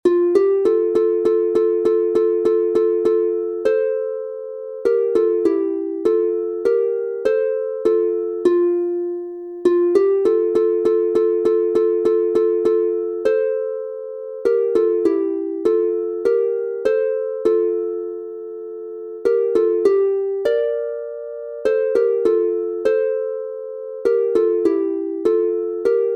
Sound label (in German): Tiritomba F Dur instr, harfe